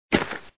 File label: drop; Gun Mayhem 2